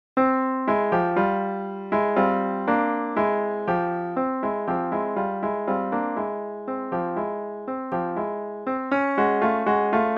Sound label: Allegro moderato